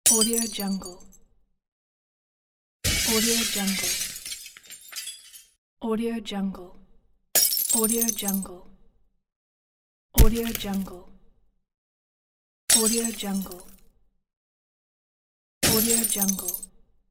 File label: glass break